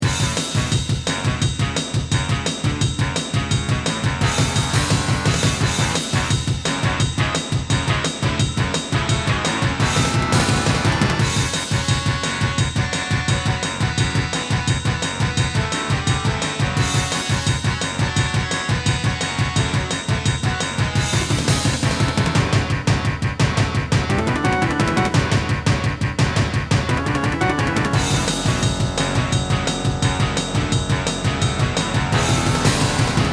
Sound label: Intro, LG MIDI ART GS1000R; Duke Nukem 3D